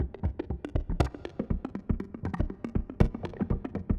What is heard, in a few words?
Mallimban C7 120